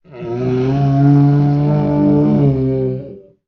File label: Ice Giant Death Vocals